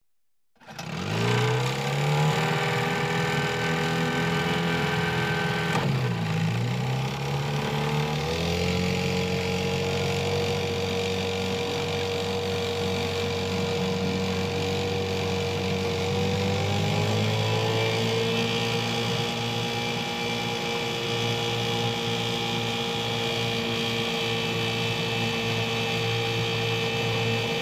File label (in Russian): motornaya lodka 6 l.s. start otezd plavanie ostanovka